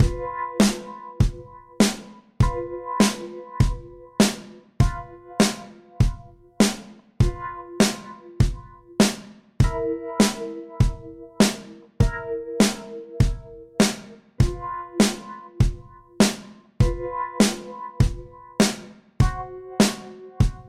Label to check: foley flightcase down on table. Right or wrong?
Wrong